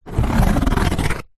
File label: foley cardboard single scratch sharp object